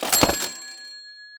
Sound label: ching